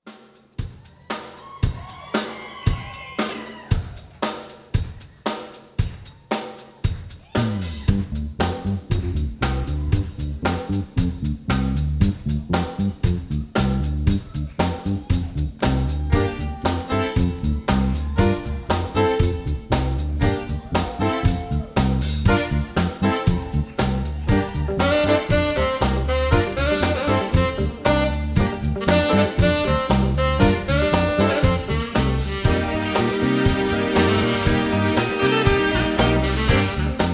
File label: live mix